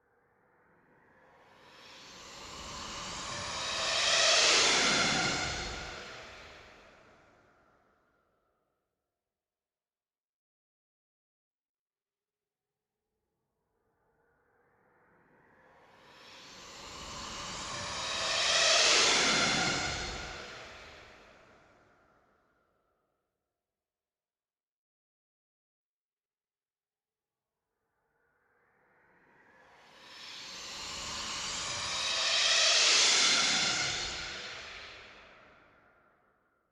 Like Fighter Jet Racing Formula1 Car Neck Neck

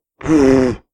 Blowing raspberry

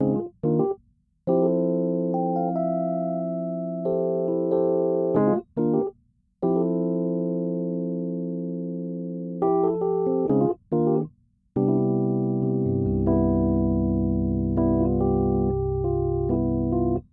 Bright Smooth Jazzy Piano 70bpm Fmaj

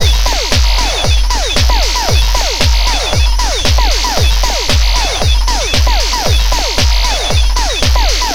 115 Bpm Stab Loop

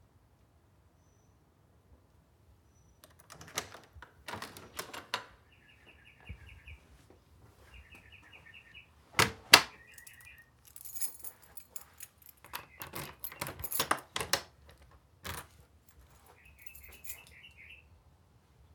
House door in out

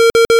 Start Sound Beep

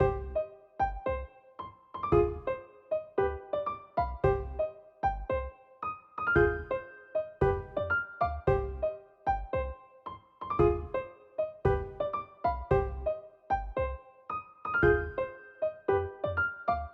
Piano Loop

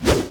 Swoosh

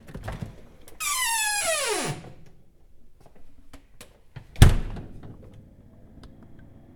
Open And Close Creaking Door

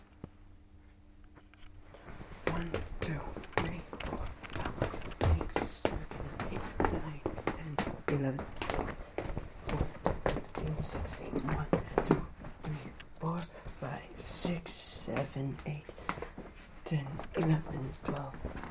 Dance Practice

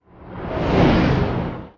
Space Fighter Pass

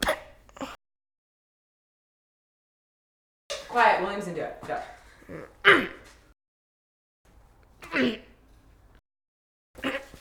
Grunts

